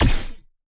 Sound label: Enemy Wall Bounce; bloom minigame